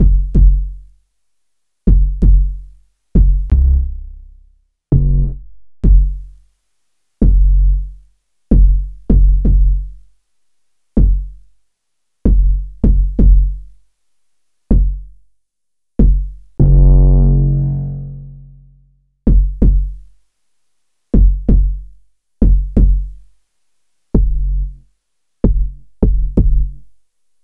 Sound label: Bass High Quantity